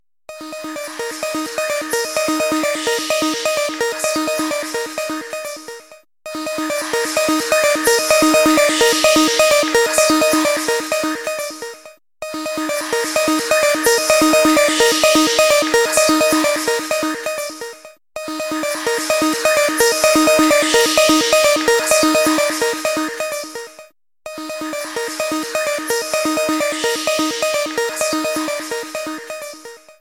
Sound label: Chocolate Beats